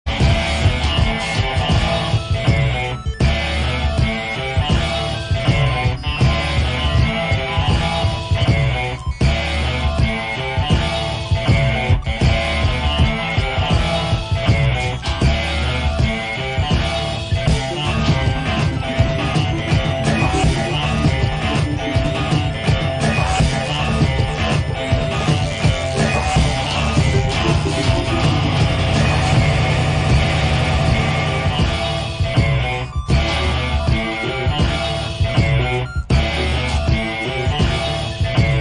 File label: Hiss